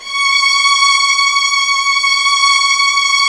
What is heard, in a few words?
Virtuoso Strings; ILIO; Kirk Hunter Virtuoso Strings VOL-3; 90 s Sample CDs; CHMB STR ESP